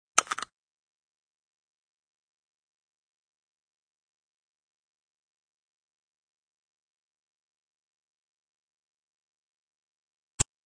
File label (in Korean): Fights, 싸움-전투; 헐리웃특수효과음